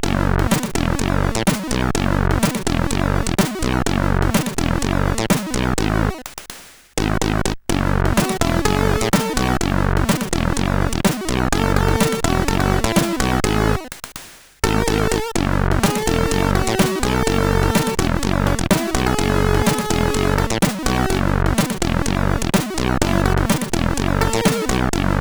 Weird Tune, sid CSG8580R5

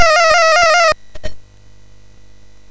Dragons Den, MOS6581R3

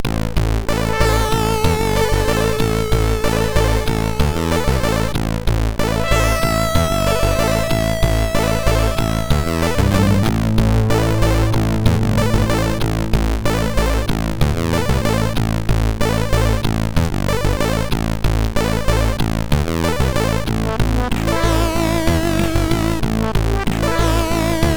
MOS6581R4, sid

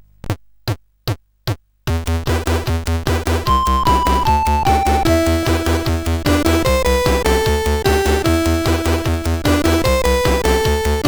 8-Bit Keys Theme

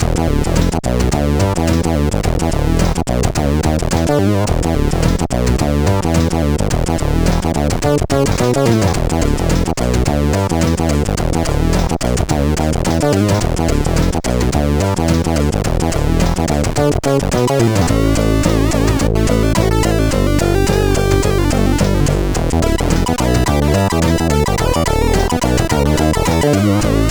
Test Heavy, CSG8580R5; Driver 14

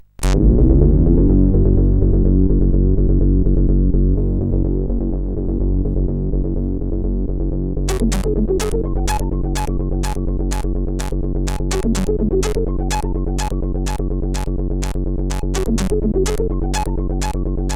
Fatal Sinus, sid CSG8580

